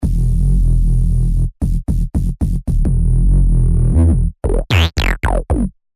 Enlightened Lows; reese; revealed serum 2 reese renaissance

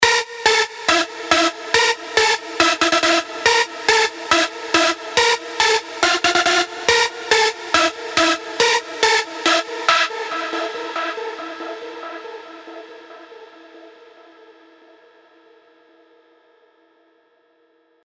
Jump Jump Jump; alonso 18 year anniversary pack

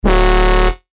Bass Shot D, synth shots tech house; Flex; revealed

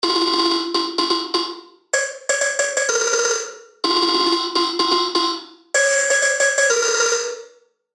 Noisy Bell; bass tech house; revealed serum 2 bass tech house vol 1

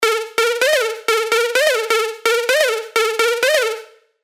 Zwaar Werk, Sylenth1 dirty dutch leads; revealed sylenth1 dirty dutch leads vol 1

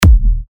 TECHKICKS D#, techno kicks; Chic; Revealed Techno Kicks Vol. 10

